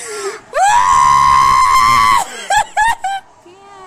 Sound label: ahhhhhhhhh